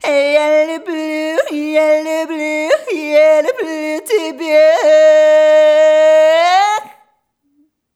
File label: Rhythmic G